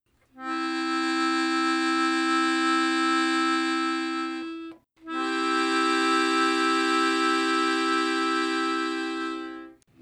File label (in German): Dur terz Dreiklang